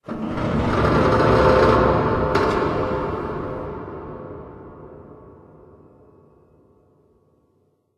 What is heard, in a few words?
Metal Distant Groan; silent hill homecoming; ps3